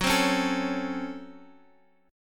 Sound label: Db Major 7th Suspended 4th Sharp 5th Gb